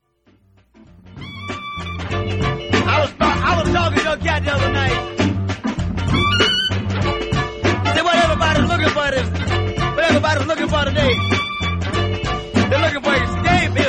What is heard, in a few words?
70s funk